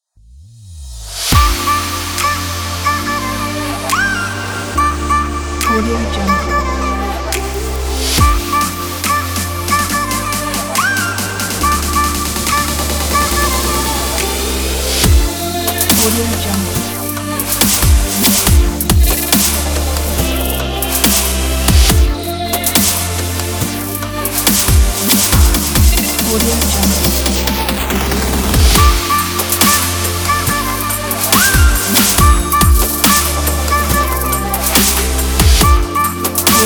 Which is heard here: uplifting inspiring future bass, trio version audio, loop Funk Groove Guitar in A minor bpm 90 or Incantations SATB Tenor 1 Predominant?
uplifting inspiring future bass